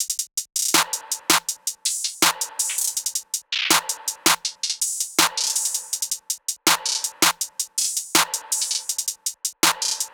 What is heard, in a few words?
beat loop top 162; bread